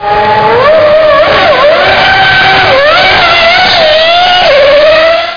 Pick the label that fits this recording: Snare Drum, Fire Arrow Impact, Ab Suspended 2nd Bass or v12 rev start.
v12 rev start